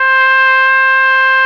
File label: oboe